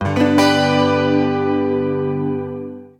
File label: Ac 12 Str Gtr G free, Twelve String Guitar, African Guitars; Quazibeat; African Secret